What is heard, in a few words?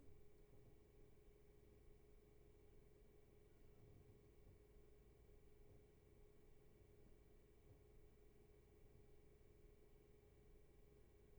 room tone